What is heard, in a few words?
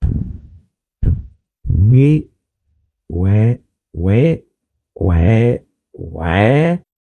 jingle